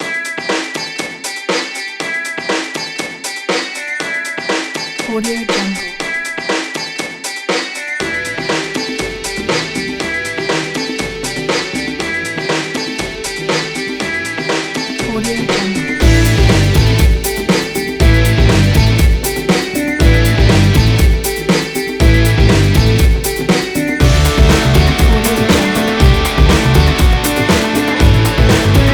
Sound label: psychedelic rock oriental